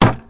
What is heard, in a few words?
wood; Mafie 1